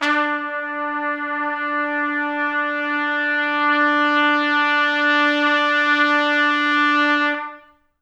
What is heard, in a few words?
D3 -R, Pop Brass; Best Service; ProSamples vol.19; AKAI